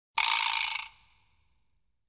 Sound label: vibra slap; Comedy, Fantasy and Humor